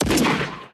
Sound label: gunshot; netent; deadoralive